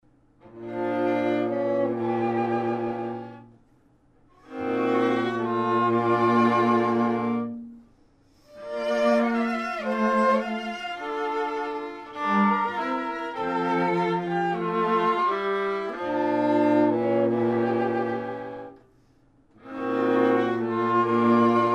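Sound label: string trio; sokolov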